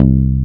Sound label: STINGER; Roland LA